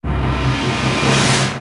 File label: Sting Boss Final Intro